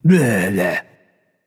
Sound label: nv tongue; smite; voicepack; hachiman